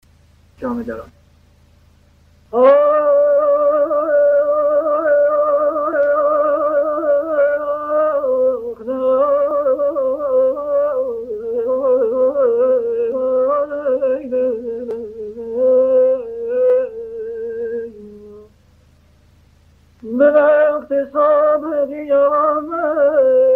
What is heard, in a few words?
jamedaran, afshari